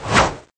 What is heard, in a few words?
mid slash, snap blade; cso